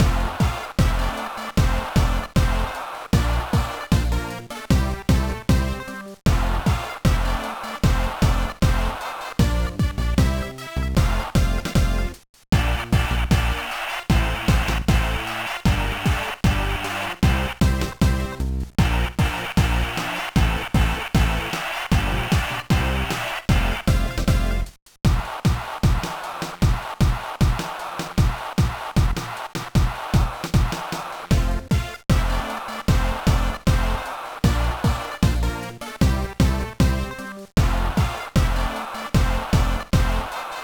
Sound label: BGM; Mezase Koushien 2005; GBA